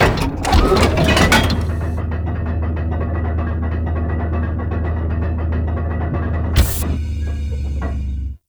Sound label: Dead End Platform Riser; Echoes; Warframe; Entrati